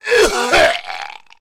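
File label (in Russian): кашель нездорового человека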